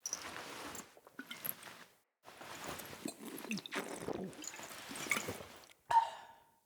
Drink Offering Seated Idle Foley

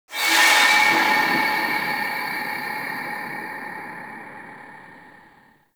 Power Rift Buff Deactivate; Gameplay; Warframe; Zariman

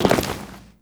Tenno B Land; Warframe; Cinematics; Lotus Intro

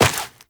Step Dirt, Footsteps, Walk; Warframe; Characters; Tenno

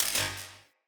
Shock Projectile Zap

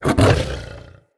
Wolf Attack Growl; Animals

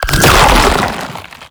Horrors Charge; Warframe; ManInTheWall; Octopede

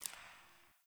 Derelict Interior Water Drip

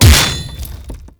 Hammer Glass Impact

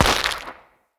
Hunter Bow Ranged Arrow Shatter; Weapons; Player; Bows; EnvoyHunterBowRangedMelee

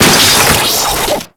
Auto Turret Close; Venus; Turrets

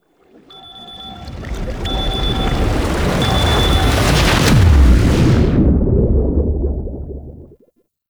Underwater Outro; World Seeder Descent

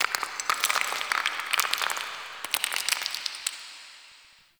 Stone Debris; Ambience; Warframe; The New War; Zariman

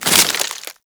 Melee Impact Wood; Warframe

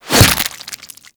Barb Hit Flesh; Warframe; Desert Skate; Hit Effects

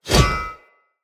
Whoosh, Swords

